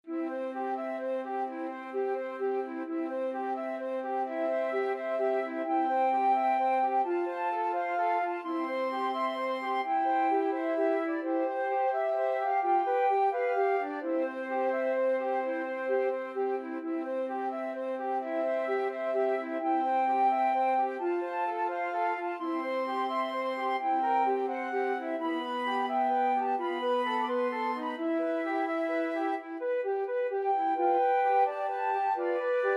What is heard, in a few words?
o holy night, flute quartet